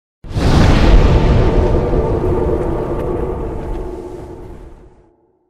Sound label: bonfire; dark souls